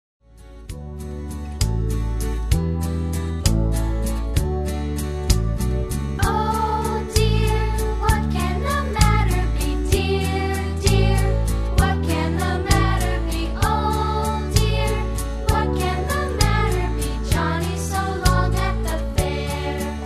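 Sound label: karaoke instrumental; childrens favorite songs; disney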